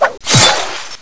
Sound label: ice stab; XMEN2